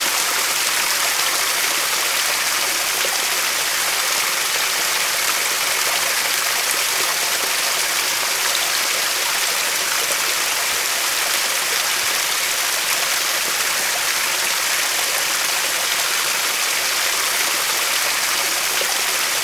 water stream waterfall small mountain; sound effects one

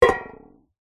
tin metal cylinder lid on set down on concrete

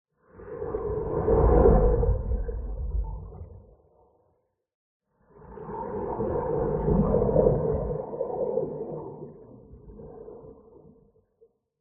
underwater move swim; lukas tvrdon

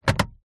car indicator blinker lever move

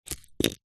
toy ballbearing plastic maze set down on carpet

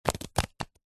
foley card pile of beer mats stack

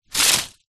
impacts branch leaf large drop to ground

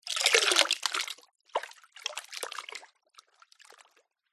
nature water sudden fast drips into shallow water

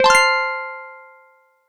multimedia ping alert; dustyroom

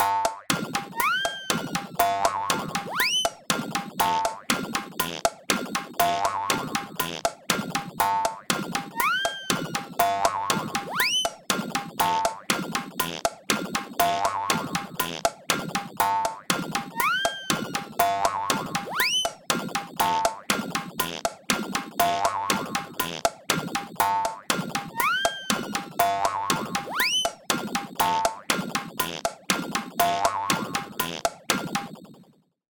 cartoon machine clumsy

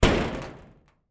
household metal door thin garage close

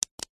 plastic button small press click; foley; zapsplat

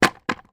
leisure scooter plastic down on ground; zapsplat

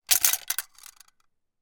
gun arisaka; still north media

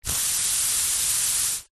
household aerosol insect spray single long burst; zapsplat

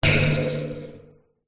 household fan desk metal basket cover bash; zapsplat

